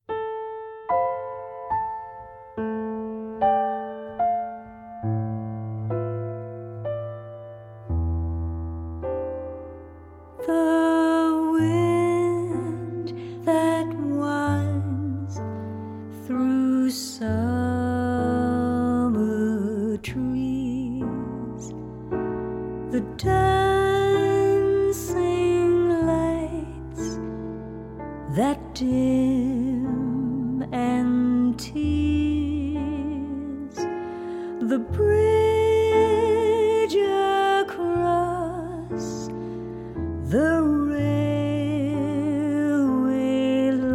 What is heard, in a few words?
Lullaby